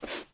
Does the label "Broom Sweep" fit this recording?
Yes